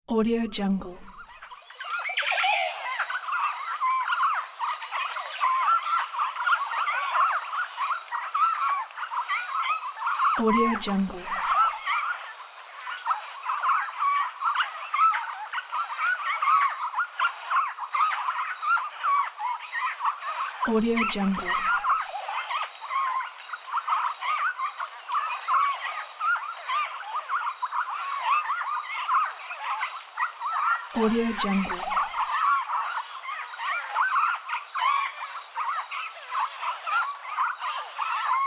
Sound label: jungle birds